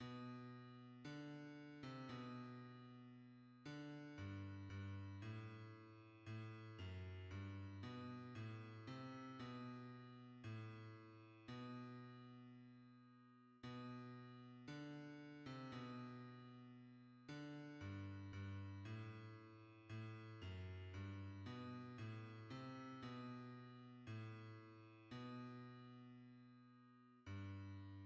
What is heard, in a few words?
bass; Contemple au Calvaire; hymns